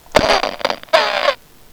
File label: Ohh unh